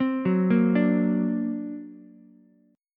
D Augmented 7th Ukulele, chord; arpeggiated; JGuitar

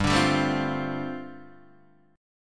G 9th Suspended 4th, Guitar Open D

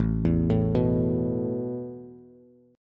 A Suspended 2nd A Bass; Asus2 arpeggiated

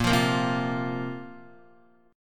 Bb Suspended 2nd Bb Guitar; strummed

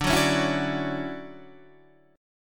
D-Major 9th D down Guitar DADGAD

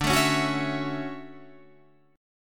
D-Major 9th D down

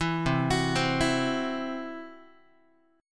C Major Flat 5th Guitar; arpeggiated; CMb5

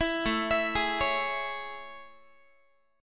A Major 7th A, Guitar; AM7; arpeggiated; 19,17,19,18,17,x

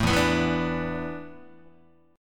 E 7th Suspended 4th, Guitar; E7sus4; strummed

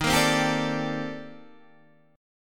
C Minor 13th Eb

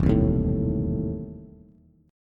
E Major 7th Suspended 4th Sharp 5th E down Bass; strummed; JGuitar

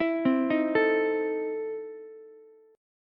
E Major 7th Suspended 4th Sharp 5th Ukulele; EM7sus4#5 arpeggiated

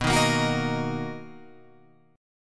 B Major 7th B down Guitar Open F; strummed

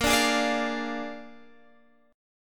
Bb 7th Bb down Guitar Open F; Bb7 strummed

